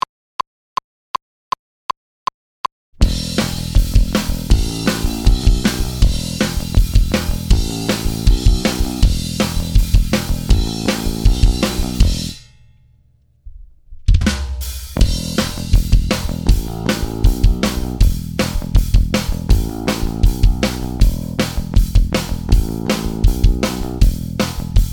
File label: punk play along, power chords; Punk Jam Track; Using Power Chords